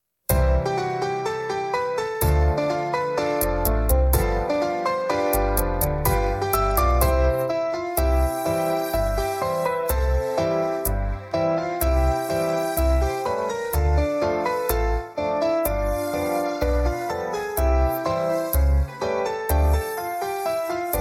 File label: instrumental